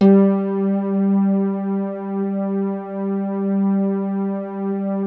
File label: G2, synth pluck; HEAVEN; Music Store - Claudius Diemeter Schwerlos